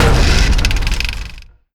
replay dn start, ui menu

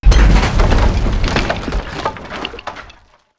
base destruction; damage; engines

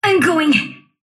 sylph move pain; dota; dark willow

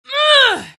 grunt; dota; legion commander

